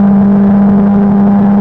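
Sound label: rTEC2 off high exhaust, Kart; AOS RF1 Kart Demo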